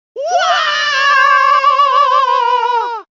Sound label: fall; cave